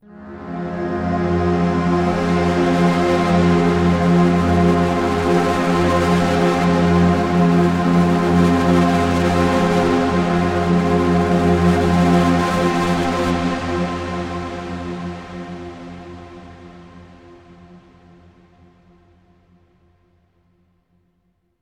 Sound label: Stinger Bonus Drone